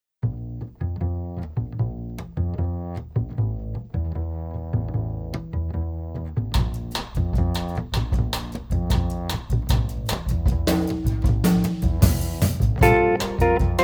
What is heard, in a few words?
Samba